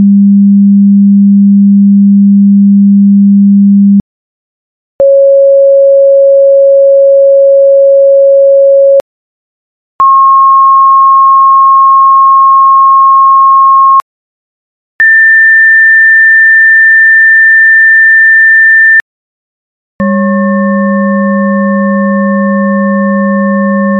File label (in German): schwingung unharm